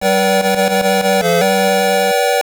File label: Game Clear; Rafflesia; arcade